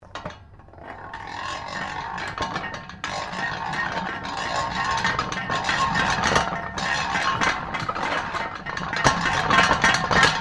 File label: BUTTER CHURN DOMESTIC METAL PAN OPERATING; Industrial butter churn; Kitchen; Household